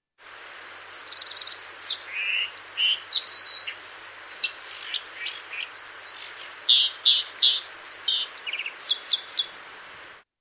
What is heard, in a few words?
Cinclus cinclus